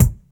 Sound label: original kick drum a sharp key; kick drum samples